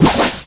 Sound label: eternal slash